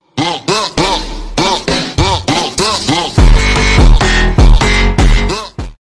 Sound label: Arab Dance